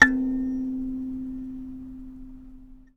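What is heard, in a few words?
KALIMBA D2; ILIO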